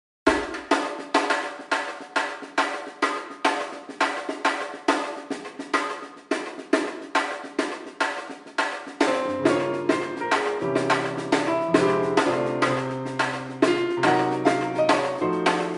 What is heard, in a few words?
minus bass